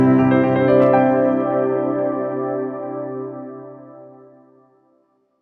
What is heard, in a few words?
victory